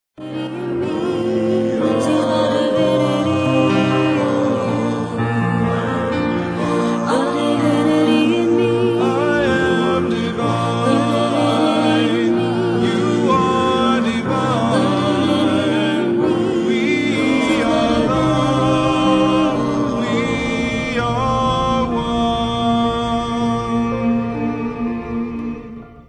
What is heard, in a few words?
Chant